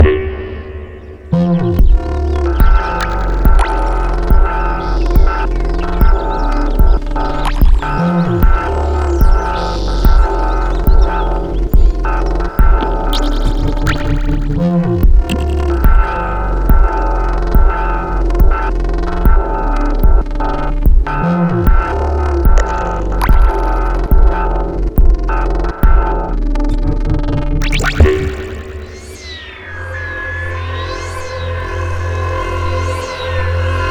Alert Loop; Nudist Area; Tokyo 42

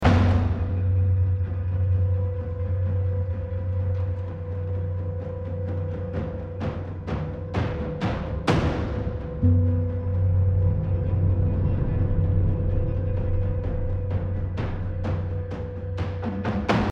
Tension

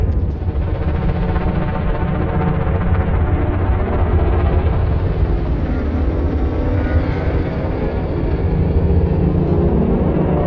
amb monster test

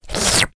wet tongue

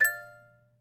Click toggle; MiSide Demo

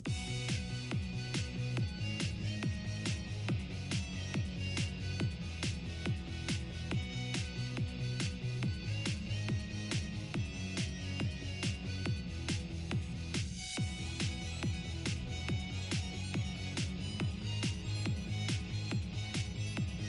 Menu Loop; Rabbids Rhythm

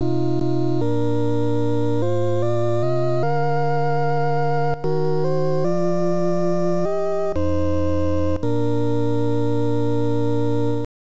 1st Period Start; Hit the Ice; TurboGrafx-16

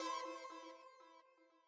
Breakout Mellow Mix Stem Layer; Breakout Recharged

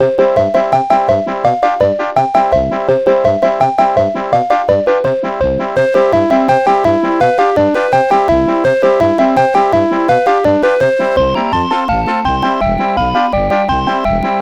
JINGLE BONUS; James Pond Codename Robocod; Nintendo DS

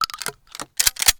weapon reload shotgun

